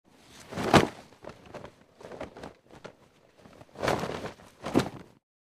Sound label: Coat Grab Throw Grab, cloth